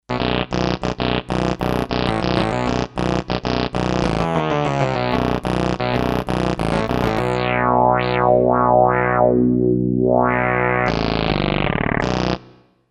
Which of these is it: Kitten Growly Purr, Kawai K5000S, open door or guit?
Kawai K5000S